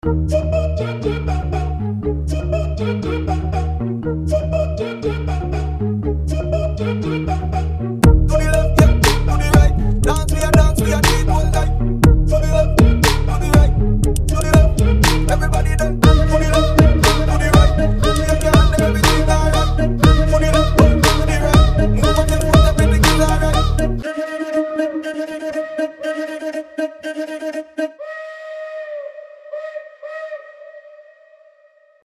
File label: Aetherpop 120 Dmin; Royal Flush